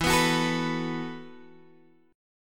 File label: E Suspended 2nd Suspended 4th, Guitar, Open G; x,9,9,11,10,9